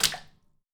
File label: Puddle